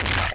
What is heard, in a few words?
Punch; RedDot